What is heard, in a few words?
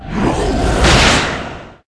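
attack, monster; mutroop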